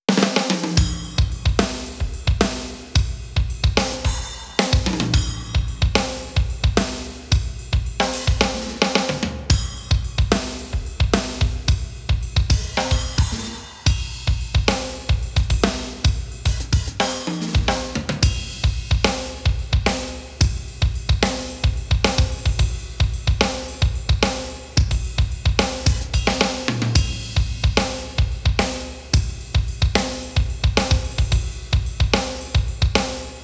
Drums 110

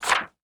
Paper Flip

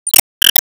Emo Pain; Disney Star Smash; Android, iOS, Mobile

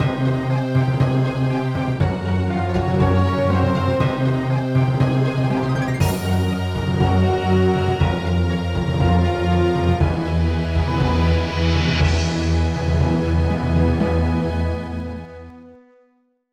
Big Door

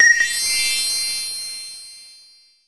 Neigbour Tricked

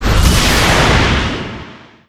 Megaflare Burst; Disney Star Smash; Android, iOS, Mobile

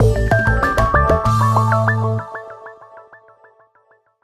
Jingle Finish New Record; super rub a dub; ps3

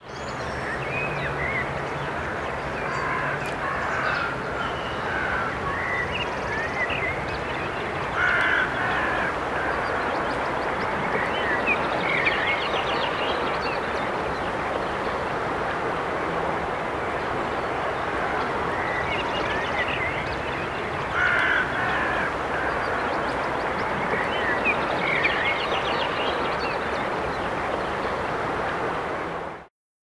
Mattmarkdam Birdinsects; Mattmarkdam Birdinsects1; Google Earth VR

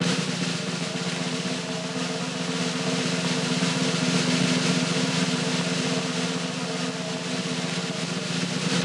Mystery Box Loop Drumroll

